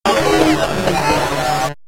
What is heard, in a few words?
robotic glitch